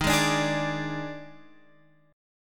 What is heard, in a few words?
Eb Major 7th Suspended 4th Eb down Guitar Open F; strummed